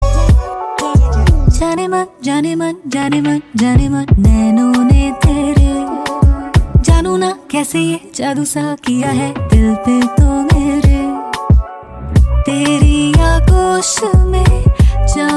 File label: bollywood song tone ringtone